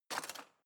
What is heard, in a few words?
knife wood drawer grab hard